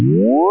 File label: ALERT; Duke Nukem 3D Kill-A-Ton Collection